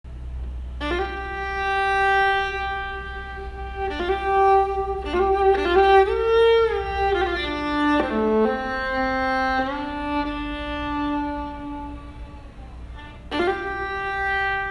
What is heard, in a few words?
Evening improv